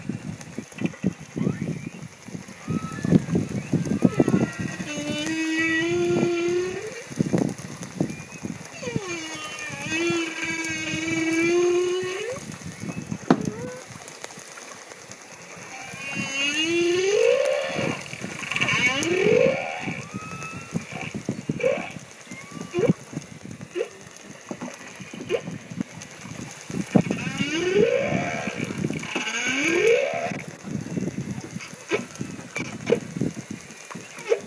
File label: Hervey Bay Humpback Whale Singing